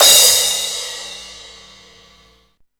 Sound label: CYMBALS, Disco House; AKAI; USB Soundscan vol.20 - Fresh Disco House I